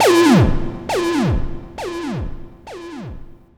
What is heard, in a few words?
Acid Echo; Sonic Foundry (Sony Creative Software); Ugly Remnants VOL-2